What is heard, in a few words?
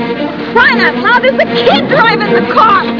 car